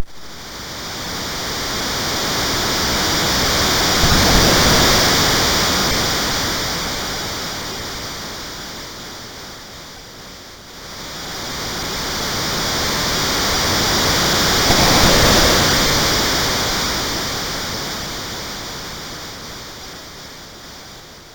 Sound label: Window Shutter, MOS6581R2